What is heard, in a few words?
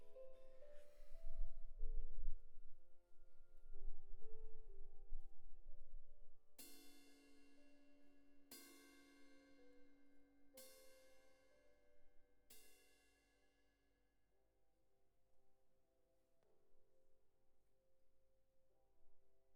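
cymbal close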